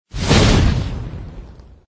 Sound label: bullet create; croods